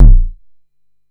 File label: Kick Synth Synth; One Shots; Drums; Mkit